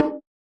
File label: 909 kit conga